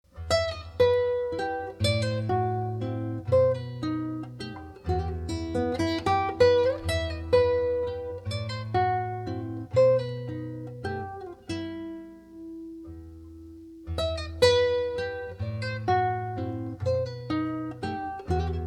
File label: Classical Guitar, Adelita (Mazurka); Tarrega